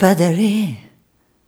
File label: Rhythmic G